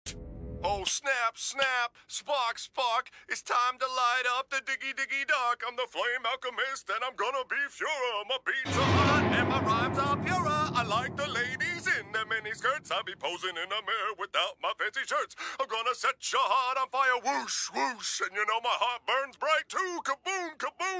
Rap

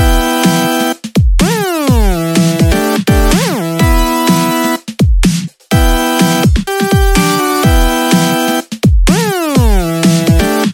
new electro bass

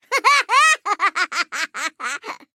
Laugh; Gnar

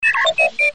R2D2 Notification

